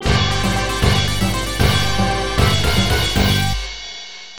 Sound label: Stage Start; Captain America and the Avengers; arcade